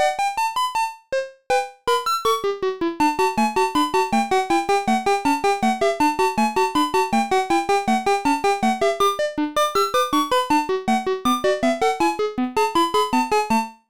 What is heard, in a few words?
Jingle BGM CLASSIC FROG DOCK; frogger helmet chaos nintendo ds